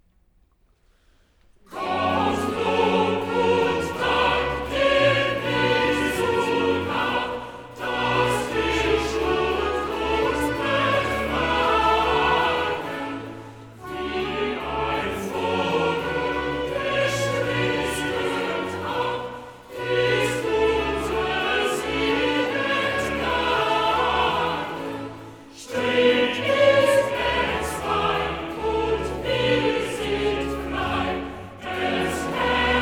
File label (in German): Choral; Cantata, BWV 14 'Wär Gott nicht mit uns diese Zeit'; 'Gott Lob und Dank'; Bach; Romsey; 4th Sun After Epiphany